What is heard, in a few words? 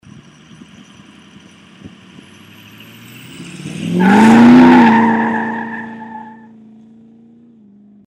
ford takeoff